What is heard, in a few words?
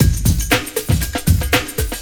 BEAT, Beats 113-123bpm, Hip Hop; E-MU Formula 4000 Series Vol. 1; Hip Hop Nation